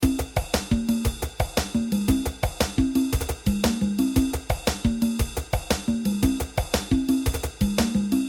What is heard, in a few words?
Afro Shufle Blues